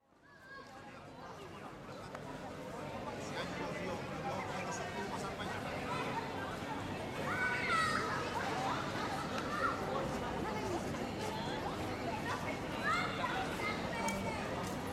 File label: Surround spanish town plaza Stereo Downmix, Crowd, pedestrian street; pedestrian street in Spanish town. People walking by in all directions; Outdoor Sound Effects; LynneMusic